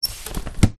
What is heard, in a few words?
foley umbrella open